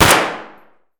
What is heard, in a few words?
grach fire indoor close; Escape from Tarkov